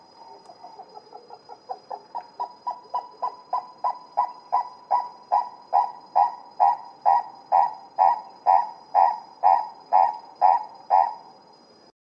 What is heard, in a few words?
turako purpurnohohlyi